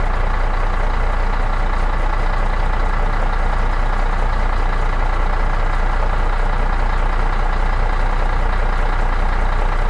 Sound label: engine